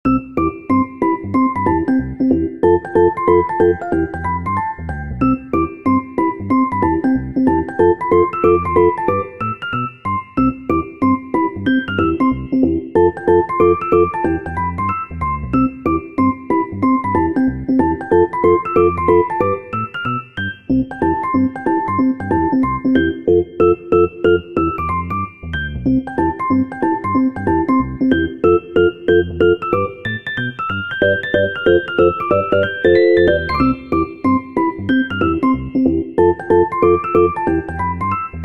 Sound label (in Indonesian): musik game